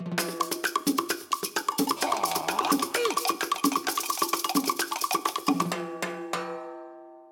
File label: Toad Shuffle